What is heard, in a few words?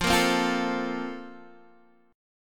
Bb Major 7th Suspended 2nd